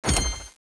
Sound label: present pickup